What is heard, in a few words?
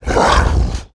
move